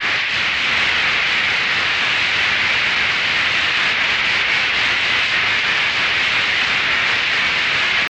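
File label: electric arc buzzing low; the loudes sound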